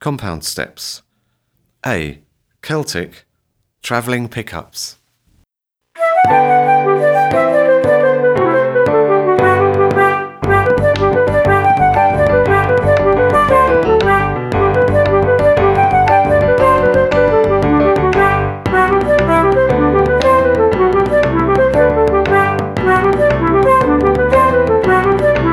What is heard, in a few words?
Compound Step Travelling Pick ups, exam music